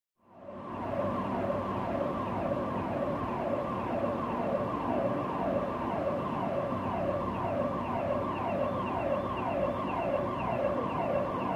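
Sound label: Distant Alarm Interior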